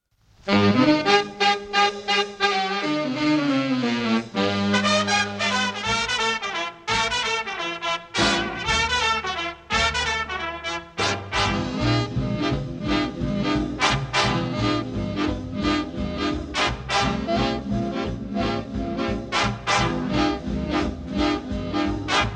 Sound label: swing